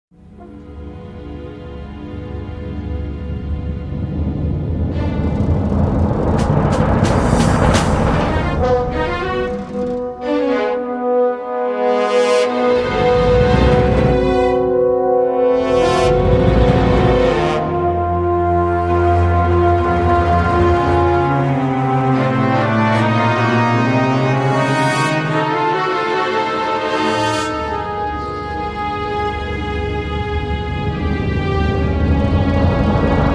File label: jagd night drama